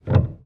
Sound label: piano release